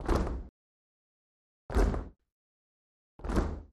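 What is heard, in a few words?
Crowd 50 Int Rhythmic, large int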